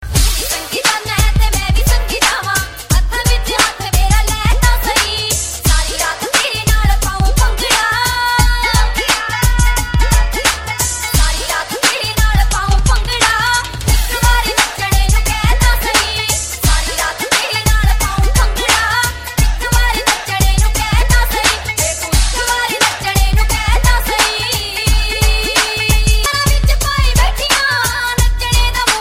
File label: punjabi ringtone